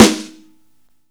Snare Live, Drum and Bass

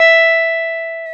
Jazz Guitar; Club-50 - Foundations; Jaz-Kessel

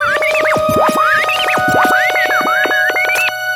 Ambient FX, Ambience FX

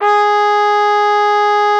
TROF G#4, TRUMPET; Xsample VOL-3

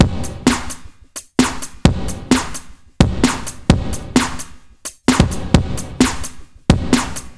130 Beat Leisure; Zero-G; Future Wave; Beats

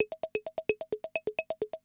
CR78

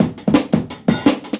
Jungle Breaks; Original Jungle Breaks

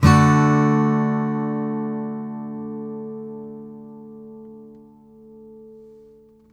MAJ D, CHDMAJ, Blues Guitar Samples; ILIO; Fretworks

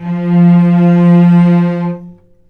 VCS ATK F3 R, VCS ARCO, String Collection

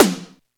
snare, AKAI XE-8; Greytsounds

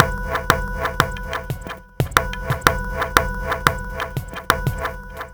Candy Machine, Processed Percussion

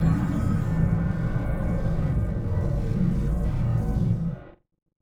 Malfunction Synth, malfunctioning synth samples

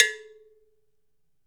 LOW CUP GO, African perc; AKAI S6000 CD-ROM - WorldSounds

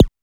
zap, e-percussion, techno; Techno ID

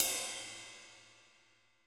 ride, Roland MC-909

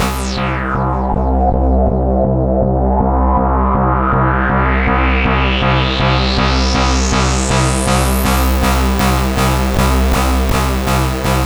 C2, SynthLine; 90 s Sample CDs; NiceBeats; SynthLine VOL-1

